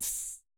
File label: ride, Roland MC-909